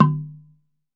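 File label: TUNG LO, Tongue Drums, Pitched Percussion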